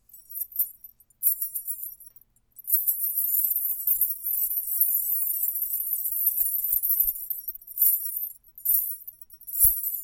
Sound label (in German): band mini indisch, schellen und glocken; Baender